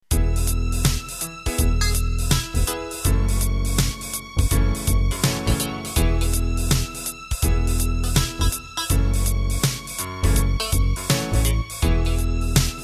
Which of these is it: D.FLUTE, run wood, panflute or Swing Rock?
Swing Rock